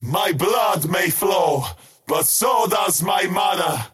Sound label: skywrath crown cast pain; skywrath mage